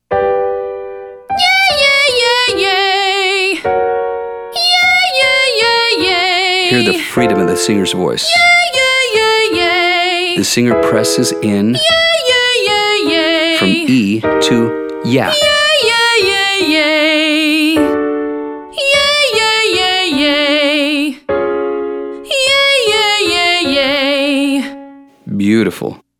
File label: Yeah Yeah female